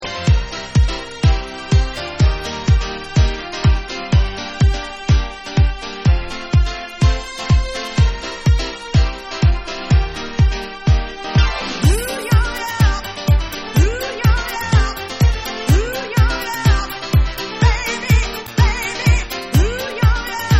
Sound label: Piano Version